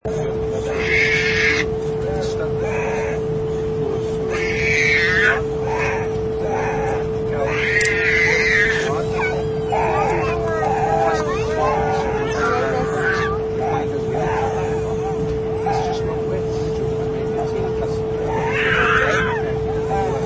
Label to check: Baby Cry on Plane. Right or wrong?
Right